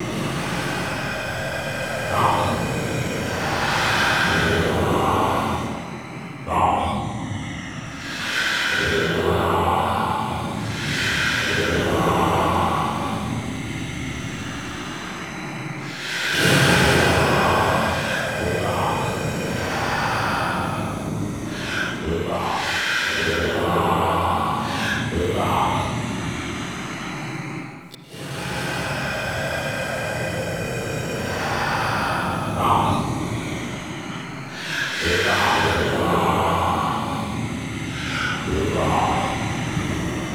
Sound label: vort healing loop; hl2 ep2; ambient, levels, gman